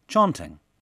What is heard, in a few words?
chanting; bbc